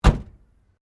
CAR MAZDA RX7 SERIES3 EXTERIOR DOOR CLOSE; Mazda Rx7 1983; Cars (Sound Effects:Vehicles)

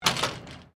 Door Pivot Exterior Aluminium Flyscreen Close; Various doors; Miscellaneous Doors

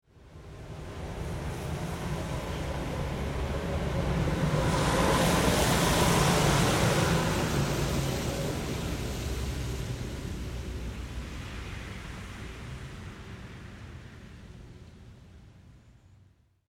Vehicle Auto Pass By Wet Road Automobile Car; Cars; Vehicles

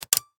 Technology Laptop Open Disc Tray; Computer Sound Effects; Computers (Sound Effects:Electronics); SFX Bible 6

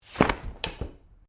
Umbrella Open; Umbrellas; Objects

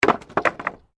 Wood Movement Medium

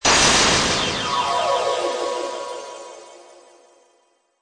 Magical Blast Twinkle Shimmer Mystical; Magic sound of casting spell, conjuring or wizardry. Witchcraft ritual summ

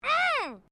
FEMALE GRUNT; Female vocal sounds; Grunts (Sound Effects:Voice)

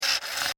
Scifi Servo Mechanical Robot Small Motor Toy

